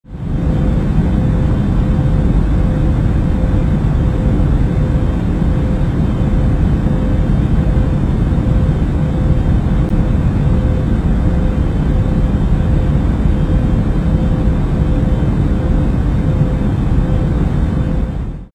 airplane

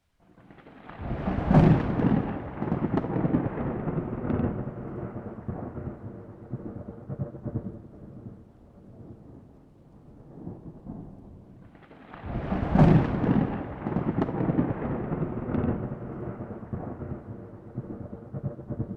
thunders

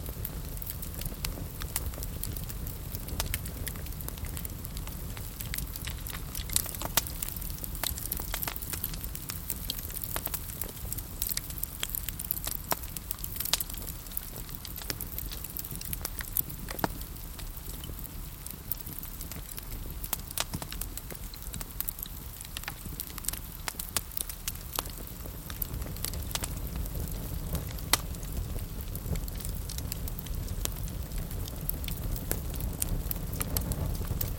campfire

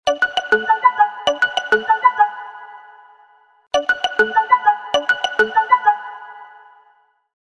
Easy Tone, alarms

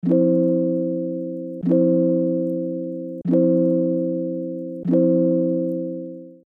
Soft, alarms

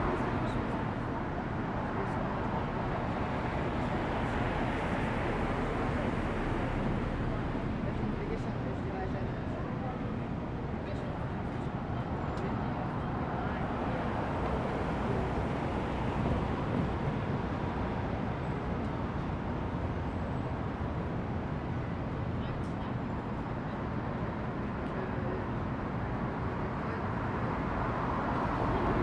city traffic

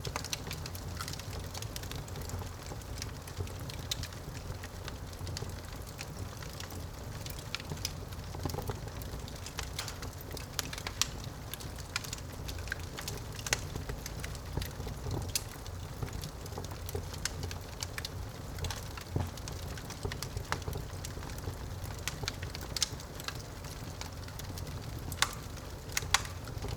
fireplace

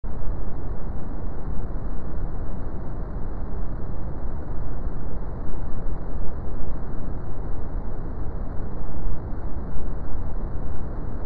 brown noise

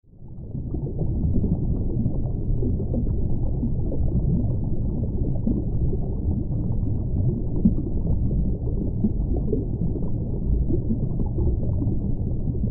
underwater

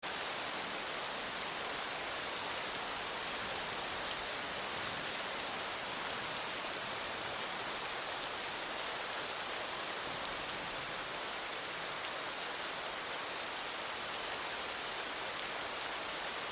rain city

